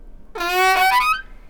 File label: Low Squeak, Door; FXHome; Squeaking